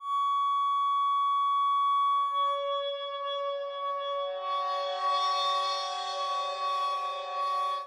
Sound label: periodic quasi chaotic; multiphonic resonance complexes in sine-wave excited cymbal clusters; Resonant Systems